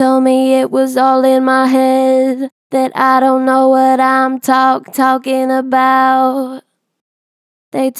Vib Told me it was all in my head, Vocals, Natural Vibrato Strong; Harmor Vocals